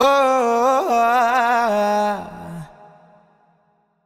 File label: Euphoria Ad Lib Wet - 140 BPM F Min; Cymatics; Vocal Sample Pack; Ad Libs